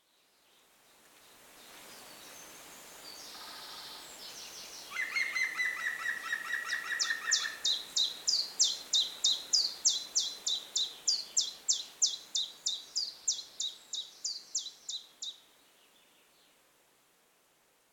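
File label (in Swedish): grönsångare gransångare; fagelljud; grongoling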